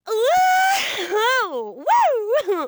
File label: Woman Dance Happy; Zomboid; Lifestyle